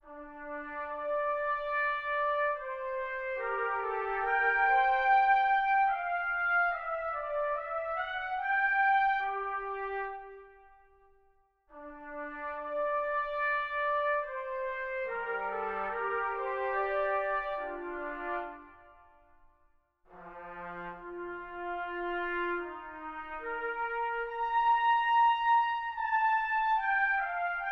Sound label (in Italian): studi sugli stretti; Ronge